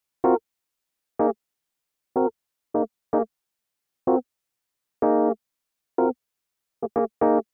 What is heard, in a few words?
125 rhodes riff C, Keys, Nu Jazz House; Nova; PowerFX